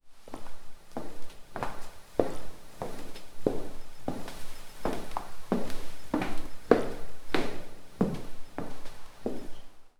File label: barefoot steps on warm, house